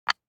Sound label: DICE on Wooden Tray, Drop, Standard, 2 Two Dice; Samples; ArticulatedSounds; DICE Sfx Party (Extended)